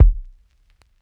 SUB GRUB KD

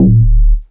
D Sub

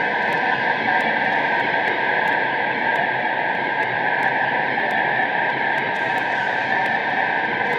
Atmosphere Top Noise Texture 123

